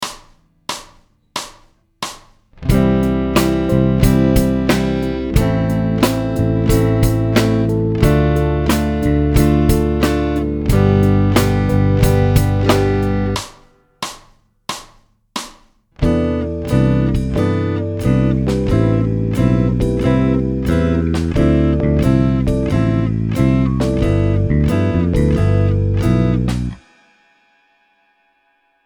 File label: chord